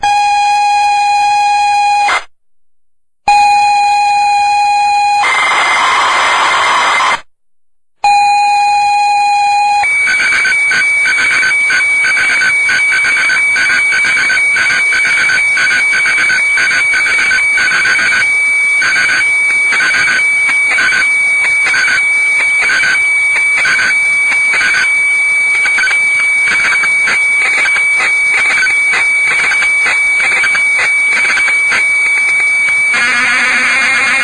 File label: ZX Spectrum Loading sound